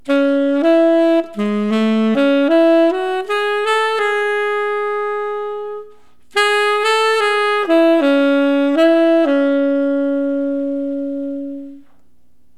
Solo Tenor Ballad Amaj; essential sounds II

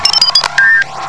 Computer; KinderGrid Demo

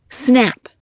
snap

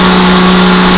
car fast

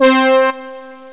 Brass; JP Brass

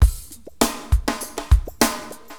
Drums, AcidJazz Funk

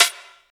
snare

